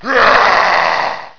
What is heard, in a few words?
Tank